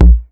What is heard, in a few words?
DUTCH KICK; Masterbits; Soniq Elements